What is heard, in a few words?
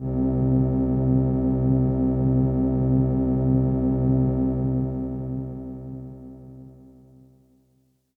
Pad C, Synth Prophet One Shots; Electronisounds